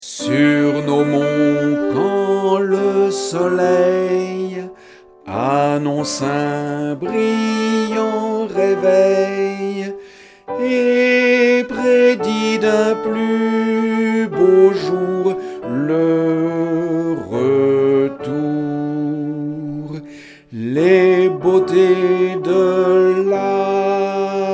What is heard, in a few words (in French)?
cantique suisse chant sax; fete nationale